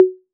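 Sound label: 808 high conga, Tape 808, Conga; Goldbaby Tape808 & Tape909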